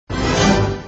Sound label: crush